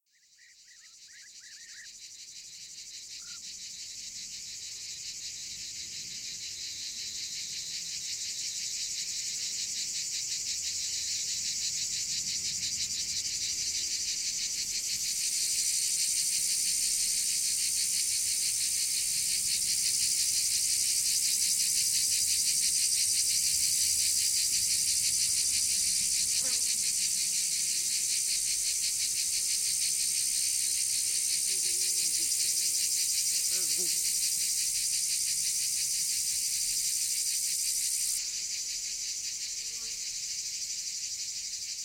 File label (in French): choeur cigales